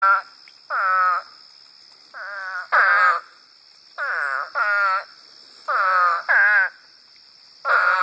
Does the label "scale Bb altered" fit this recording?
No